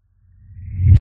swell rumble with hiss thin resonant ends with snap whoosh reverse; transition; Skyclad Sound